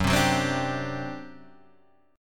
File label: G Major 7th Suspended 4th F sharp